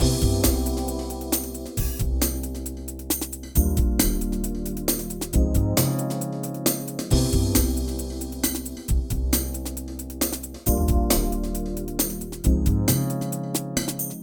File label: Loop Jungle